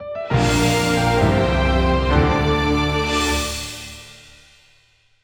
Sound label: SFX UI Stage Clear; Tears of Avia